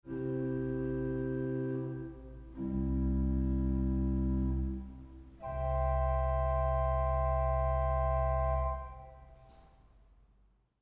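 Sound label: Rohrflöte 8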